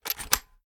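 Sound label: foley reload start load; weapons; gmod; viper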